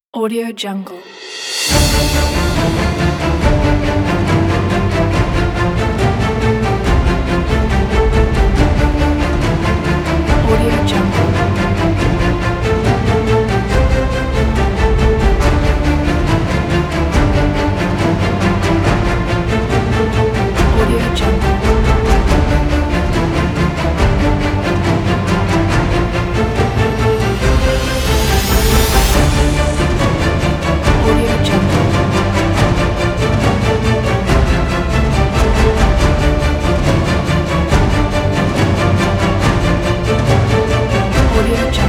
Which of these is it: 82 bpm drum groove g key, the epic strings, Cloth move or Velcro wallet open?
the epic strings